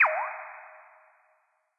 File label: water